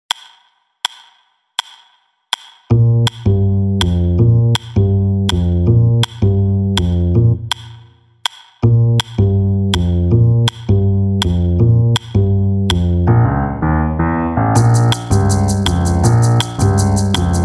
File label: spy jazz